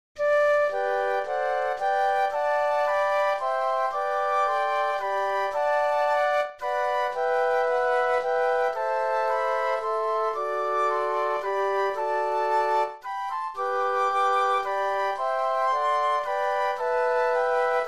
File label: Flute Quartet; christmas